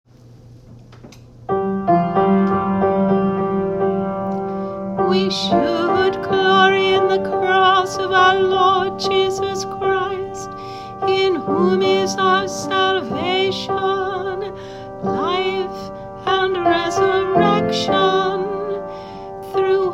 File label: Entrance Antiphon; Holy Thursday